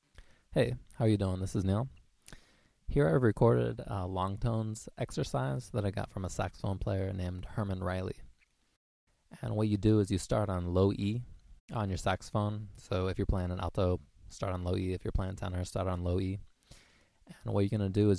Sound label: long tones saxophone first four notes